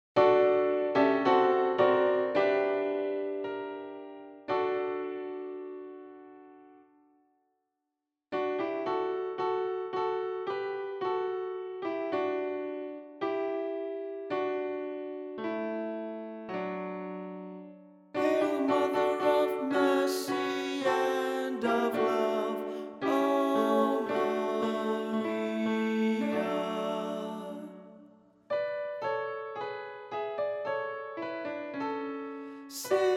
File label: Hail Holy voice 3 and 4, choir